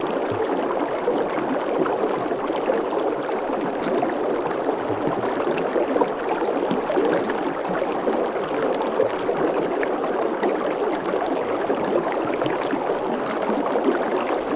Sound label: Stream Fst Gentle Bab, streams